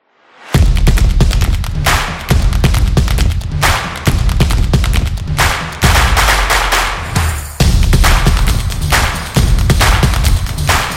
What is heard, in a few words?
This Is Drum, percussive